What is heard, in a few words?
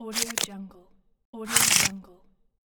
card shuffle; bergamp